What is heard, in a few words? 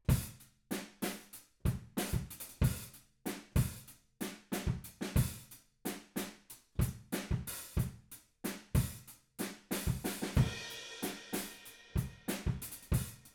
Room